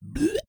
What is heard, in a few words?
Monster Spit